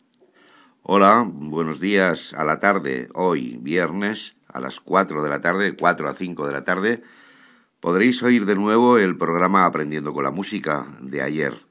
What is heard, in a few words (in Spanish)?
concierto de campanas; llorenç barber; opera